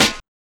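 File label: HSE SD, house snare drum; X-Static Goldmine 1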